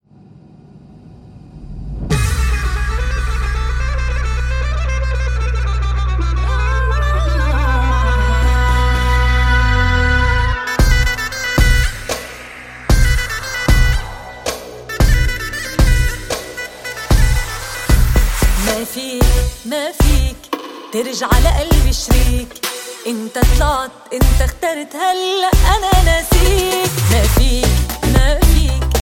turkish arabic ringtone

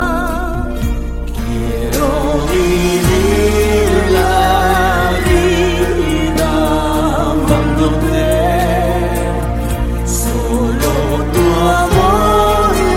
spanish ringtone; zorro

